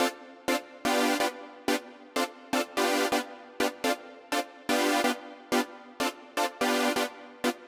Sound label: Synth, 125bpm Dm, Nu Disco; Zero-G; Construction Kits; Speedy